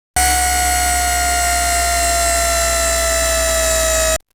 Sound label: Zoom out